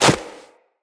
Run Snow, footsteps; Operator

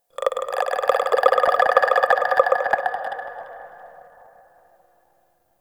Corpus Infested Tendon Chatter; Warframe; Ambience

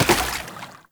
Step Water, Foley; Warframe; Oraxia

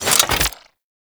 Spear Pickup; Warframe; Corpus; Spear Gun

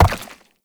Step Goo, Movement; Uriel; Warframe

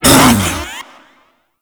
Attack Short, Vocals; Enemies; Warframe; Proto Infested; Ape Prelate

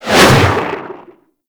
melee slam generic whoosh; Warframe; weapons

